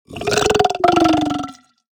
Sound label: Death